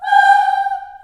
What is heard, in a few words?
G4 child oh, Choir; CHILD OHS